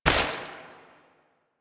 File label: Step Water